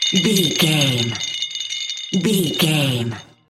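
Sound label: witch doctor shrine bells jingle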